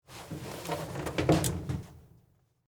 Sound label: Bleacher Seat Up, Stand, Up; Foley; Arenas